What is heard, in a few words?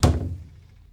Door Close; Carrot; MiSide